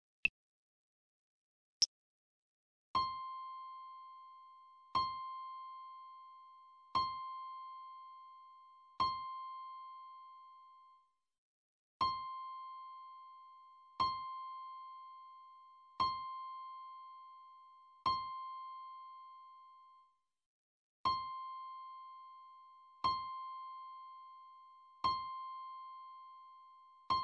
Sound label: c piano